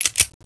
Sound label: 9mm clip